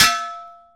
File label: bong, Bells; Misc Percussion